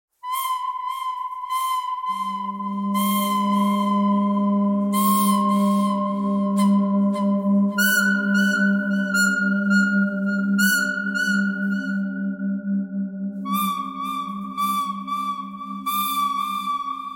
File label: bird birdcanyon thread pentatonic; birds